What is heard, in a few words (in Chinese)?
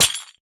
锁链破坏声